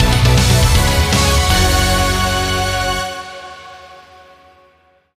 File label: big Win Loop End; neon staxx